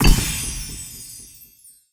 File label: fs Wild Land; netent